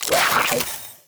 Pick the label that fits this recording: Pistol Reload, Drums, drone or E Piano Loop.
Pistol Reload